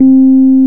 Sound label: PNO, Rhodes Mk.V; Keyboards of The 60's and 70's